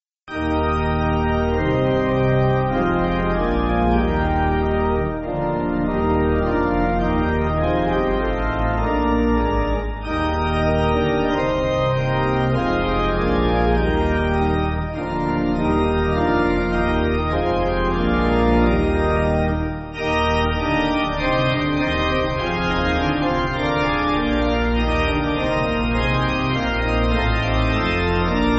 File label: Pipe